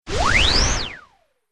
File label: Whistle Whoosh